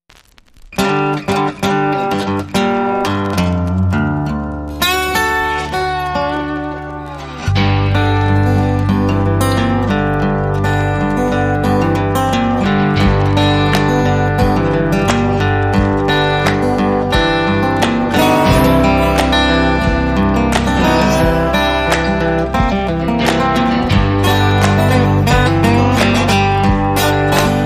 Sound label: Blues